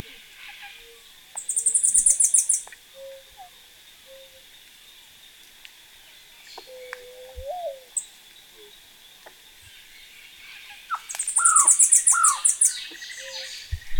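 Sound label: Hispaniolan Spindalis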